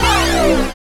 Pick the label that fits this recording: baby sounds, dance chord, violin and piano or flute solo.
dance chord